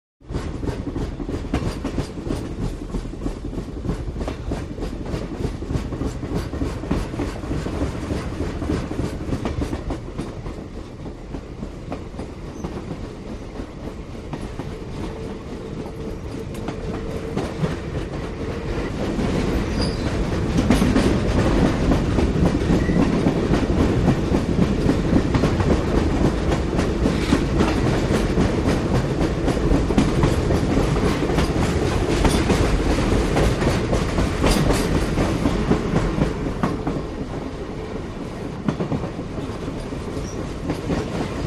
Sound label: Train Through Tunnel, passenger